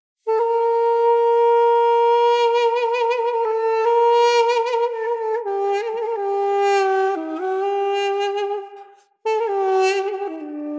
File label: Duduk; raji an ancient epic